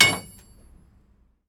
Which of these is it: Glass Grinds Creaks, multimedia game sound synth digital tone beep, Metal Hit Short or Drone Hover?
Metal Hit Short